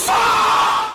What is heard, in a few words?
headshot